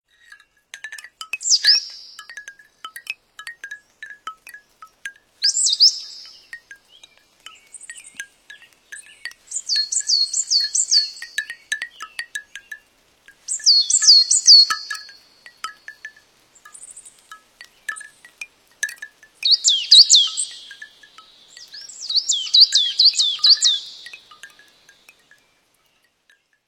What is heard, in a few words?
Birds in the Woods, Nokia; 515; Alert tones